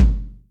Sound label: Maple Amb, Yamaha DTXpress Acoustic Kick